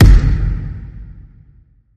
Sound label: Basketball Deep Hit